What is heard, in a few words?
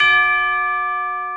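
Tuba D4; Digital Sound Factory Virtuoso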